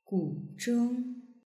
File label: guzheng; yueqi